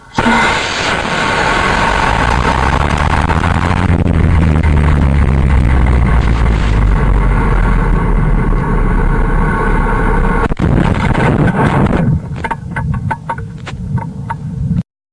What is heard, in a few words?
Flight half speed